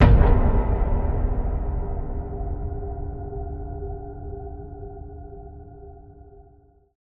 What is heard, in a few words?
Impact Boom Metallic; bottlerocket